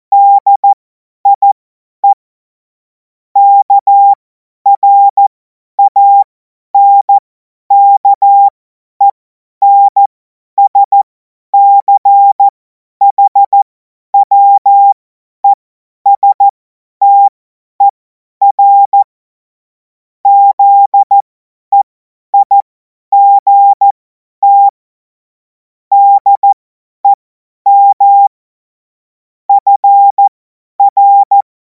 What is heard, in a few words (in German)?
Witz, cw morsen; Morsekurs